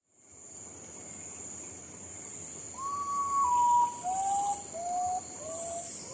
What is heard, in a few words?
Potoo Bird sound